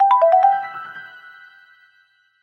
sub alert

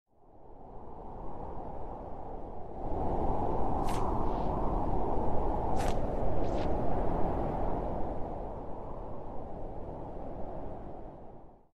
winter wind wind sound fx

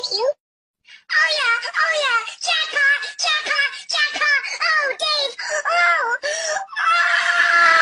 ooh yeahh

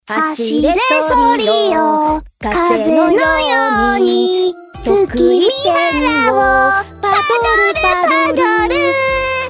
singing together; alter and nero

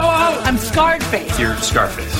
ringtone

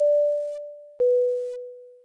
seatbelt

